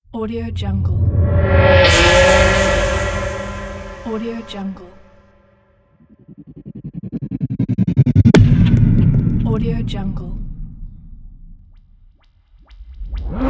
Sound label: transition stab pack